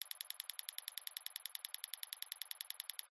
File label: USER INTERFACE LOOP; PMSFX